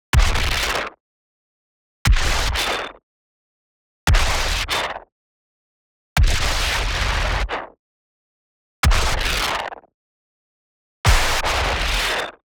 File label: IMPACT WHITE NOISE Noisy Crunchy Thud; boom library; Anime Essentials